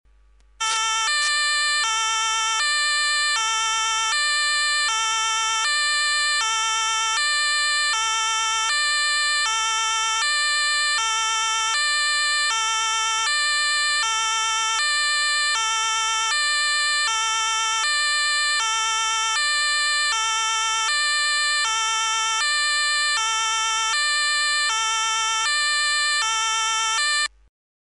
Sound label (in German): sigtech Z5120 stadtsignal